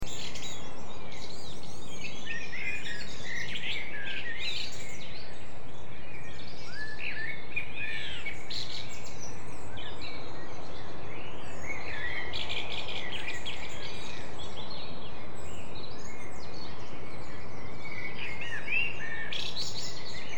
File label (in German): amsel